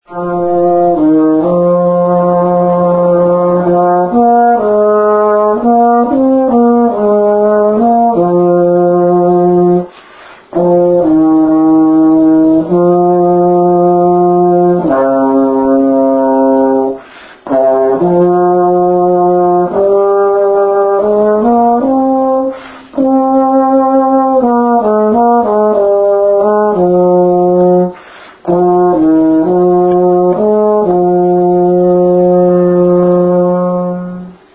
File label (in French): tuba